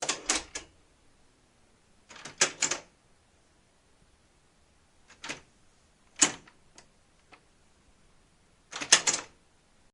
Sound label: hospital drawer fidget